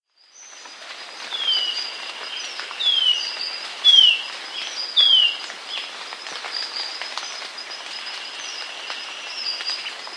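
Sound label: Alagoas Antwren; S. America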